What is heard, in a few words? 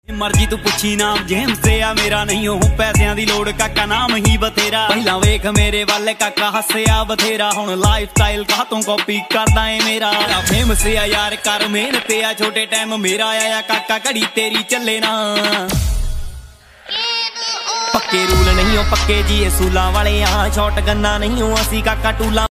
Punjabi Song Ringtone